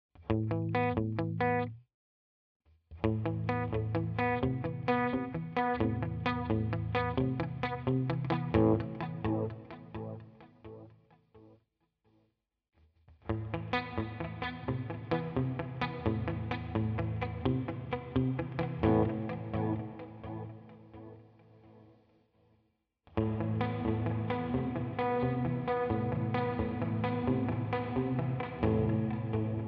Precision Mod Plate; UAD DelVerb

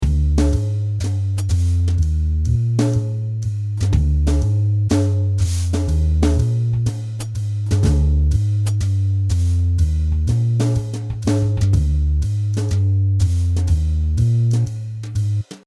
jazzy brush bass premium

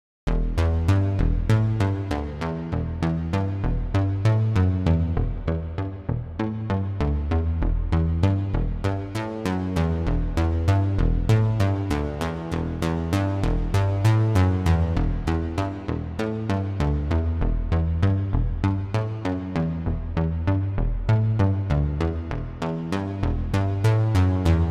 Eventide SP2016 Room Vintage

